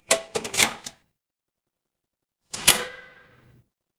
mechanical lever

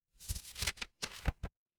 paper fabric page turn

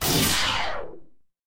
magic scifi laser

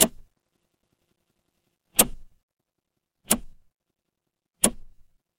mechanical switch

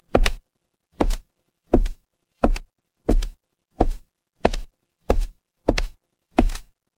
footsteps wood

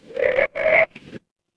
animal frog

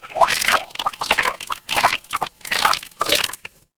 water swirl

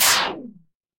retro game laser

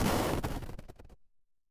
retro game explosion

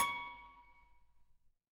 instrument singing bowl

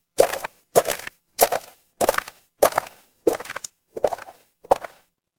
footsteps gravel

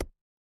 impact punch

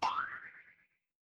cartoon bonk

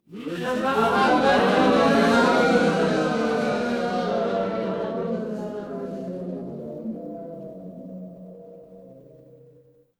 crowd gasp

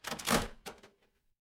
door lock

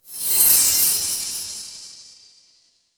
magic scifi spell

